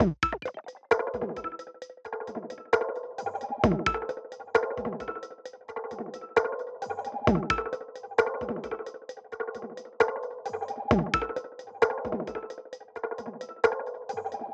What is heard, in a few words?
loops